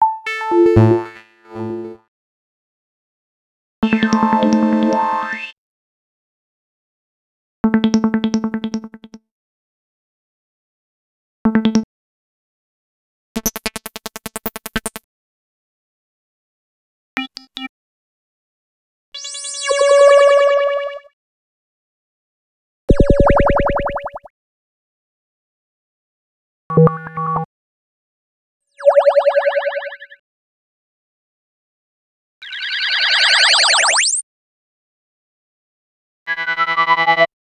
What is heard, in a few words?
Lfoid FX; X-Static Goldmine 5